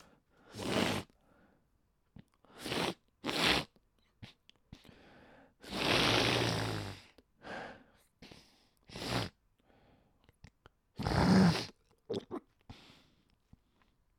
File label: Running Nose